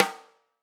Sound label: Tam Rim, drum